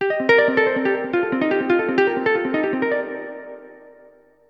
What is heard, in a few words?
ELECGUIT, AMBNT, 160, C; eJay; Ambient; Guitar